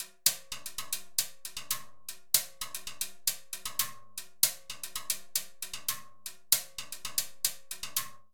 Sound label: Afro Jazz Timbales-115bpm; Latin Percussion; Ableton; Factory Packs